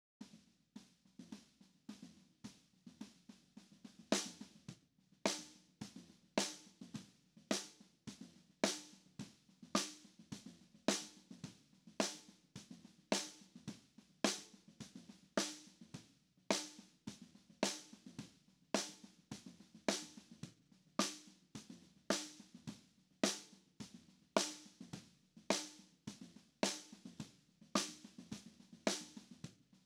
snare bottom; AugustRain

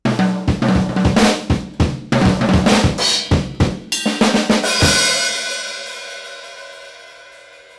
break 100, acoustic

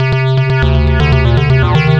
acid 120

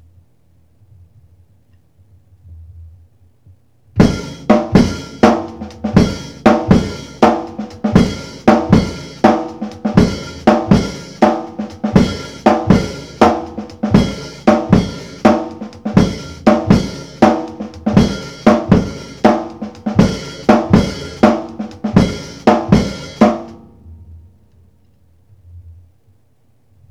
beat 120, acoustic

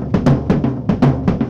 tribal loop 120

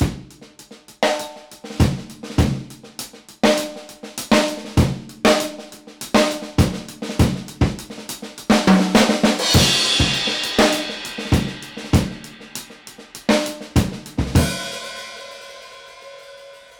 beat 100, acoustic

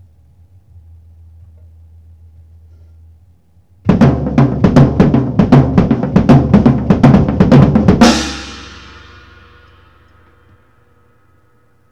break tribal 120, acoustic

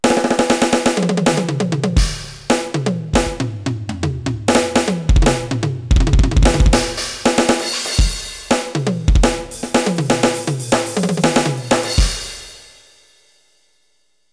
spin break 120

